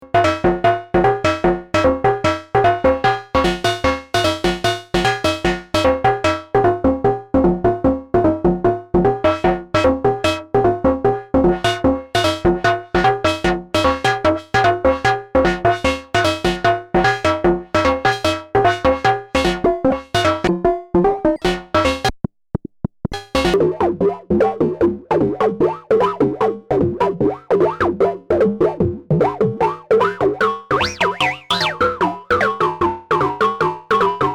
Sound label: fm perco moog